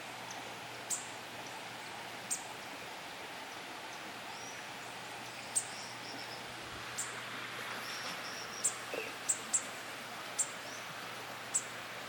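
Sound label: Purple honeycreeper, bird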